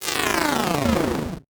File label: Title Transition Power Down; Production Element; AdobeCC